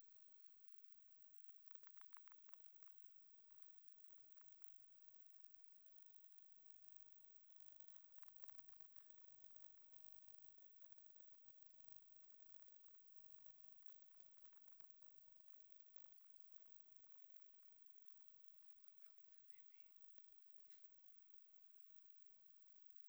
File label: Rhinolophus trifoliatus 20kHz HP